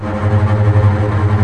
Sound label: TREM STRINGS